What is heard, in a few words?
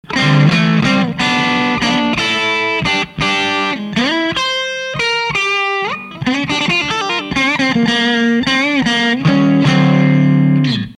none, F-DRIVE BYPASS, VOX AD30VT BOUTIQUE CL; MIDDLE PU; GAIN全開VOLUME全開; TREBLE2時,MIDDLE全開,BASS1時; Honda Sound Works LOVE typeA